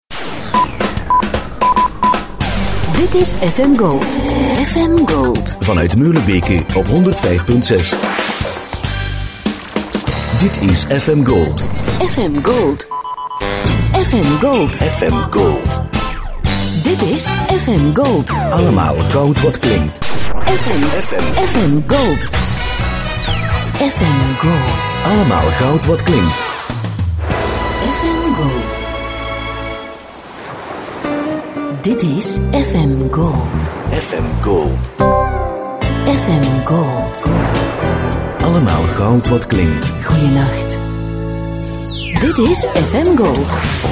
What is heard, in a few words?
jingles; FM Gold